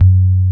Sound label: POPPIN SUB L, Hip Hop; E-MU Formula 4000 Series Vol. 1; Hip Hop Nation; Big Bottoms